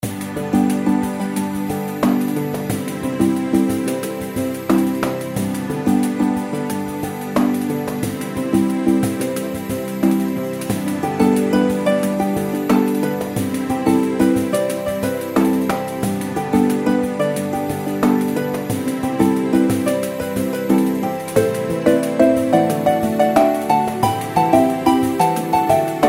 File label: snow leopards reelspin bg